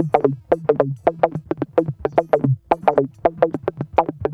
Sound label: Guitar Loop; Ambient Grooves